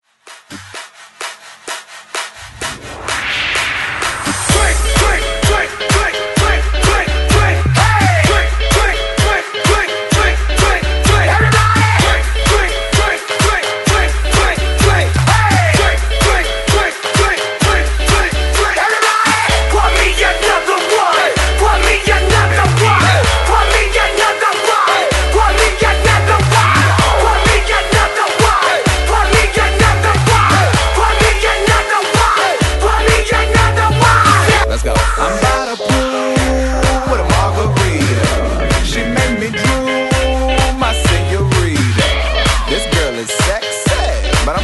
Remix Hype 128 Bpm; DJDOLLS; Unreleased Remix Hype Clean

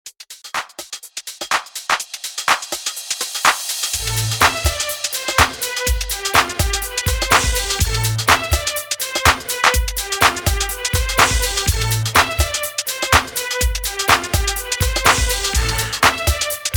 80S HOUSE MIX 124 BPM

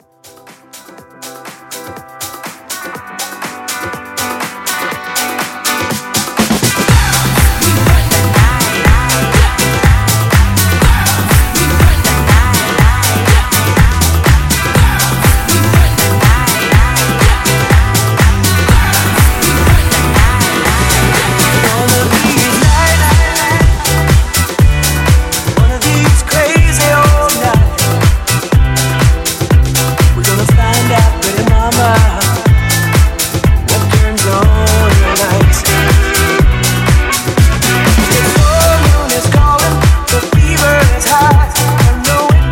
House Mixshow Edit Disco, 122; remixplanet

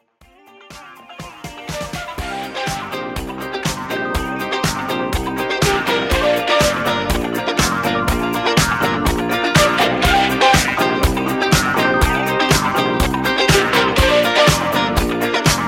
80s Funk Re Drum 122 Bpm

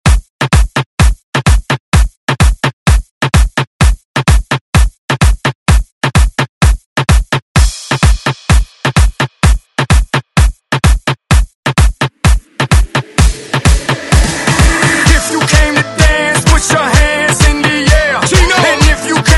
Re Mix Re Drum; DJ Mhark

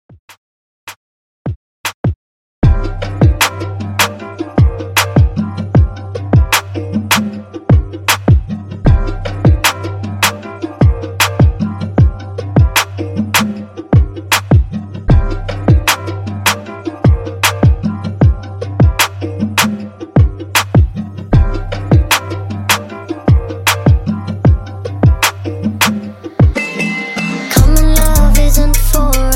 Dj Intro Outro 77 Bpm

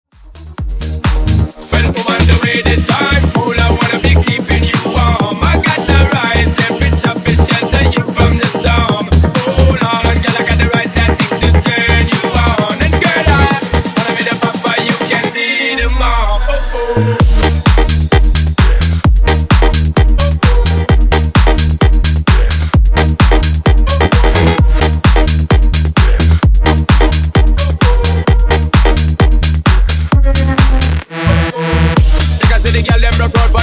Intro Outro 130Bpm

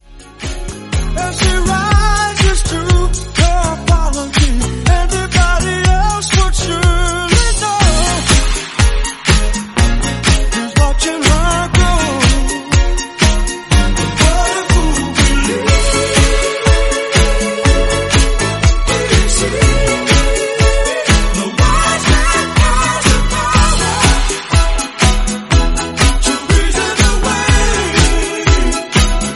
Snippet 122bpm Dbm; 1978

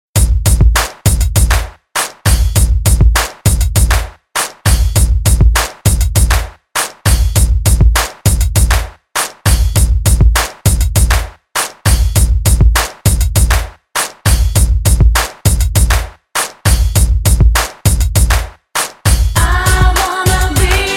Dj Intro Outro 90s Hook

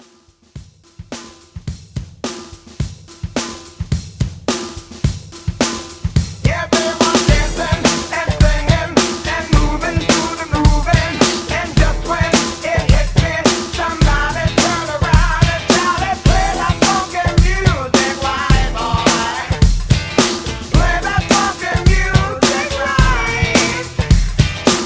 70s Mashup Re Drum

